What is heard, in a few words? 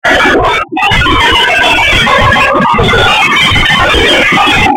Alien Sound